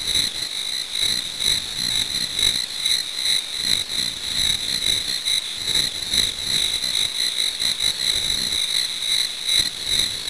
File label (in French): grillons; dod